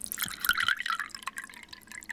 inschenken

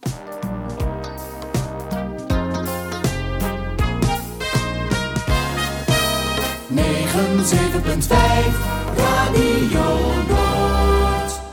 omroep jingles

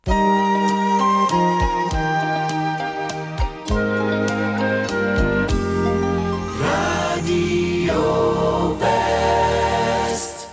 omroep jingles

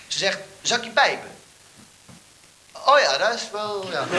Pijpen; Rosegarden

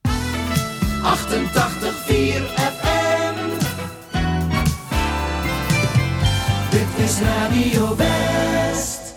omroep jingles

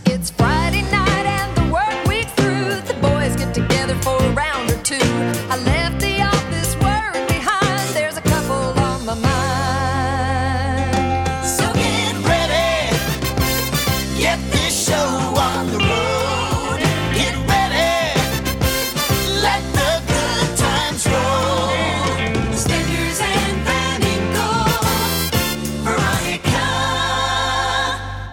omroep jingles

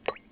bloep, Home-made Thuis

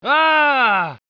schreeuw; sound effect

